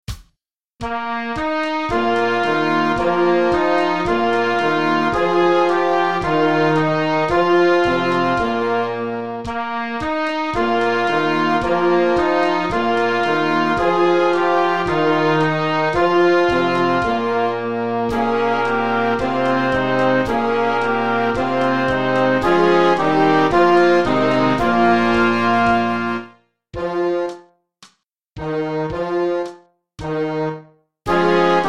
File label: fanfare